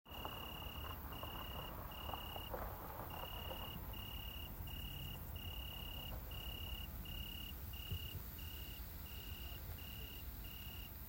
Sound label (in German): Weinhaehnchen Leipzig